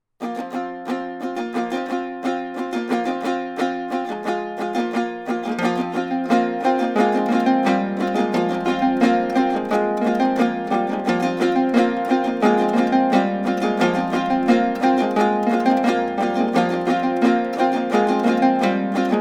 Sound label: An Dro Lyre Sutton Hoo